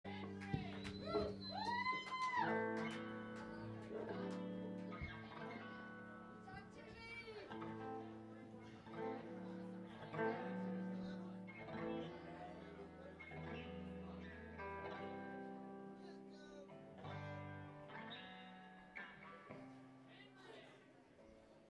tuning

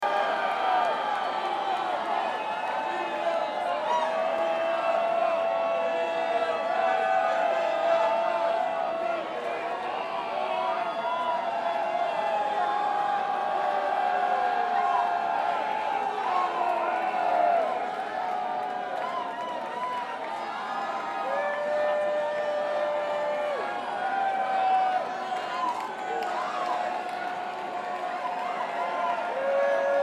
Crowd

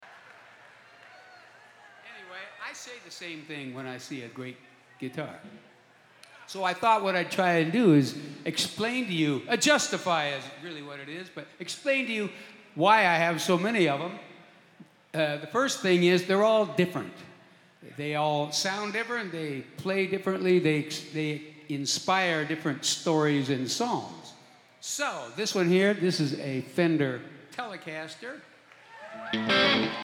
Guitar Moment

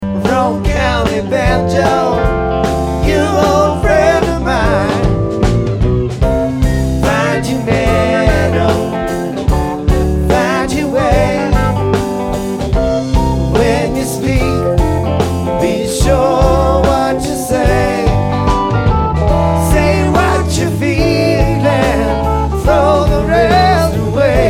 Banjo

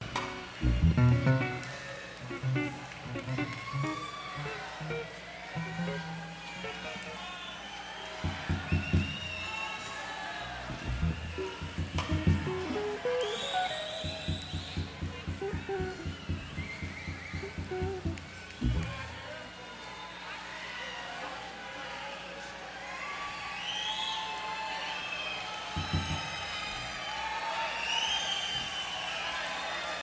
Instrumental

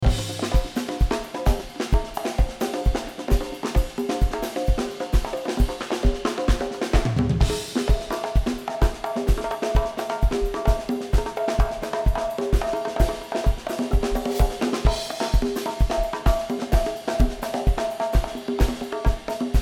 Drums

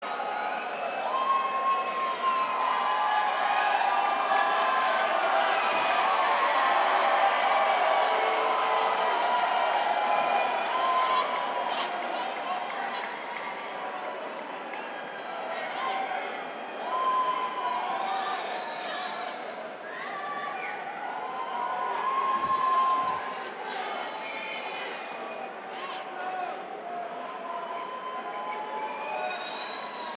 Banter Crowd

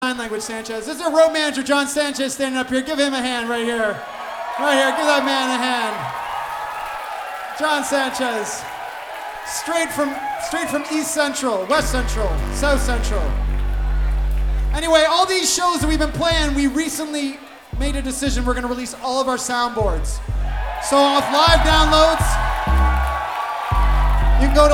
Applause

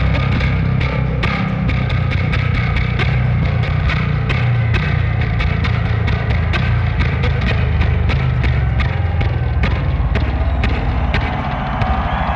Bass Solo

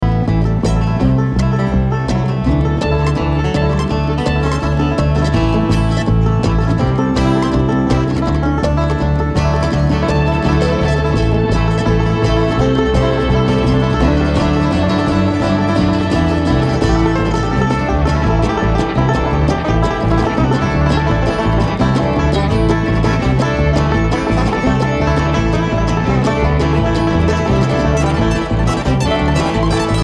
Traffic Jam

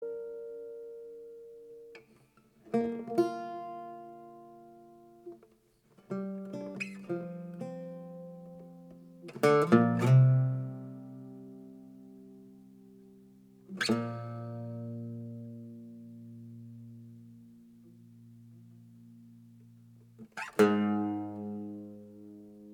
Octave Mandola Improvisation

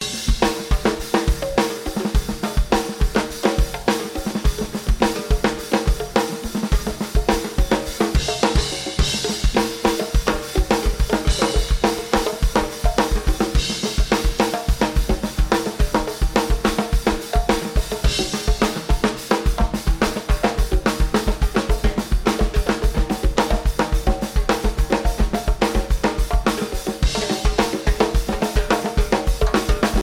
Drums

